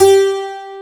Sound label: 12STR, AC.GTRS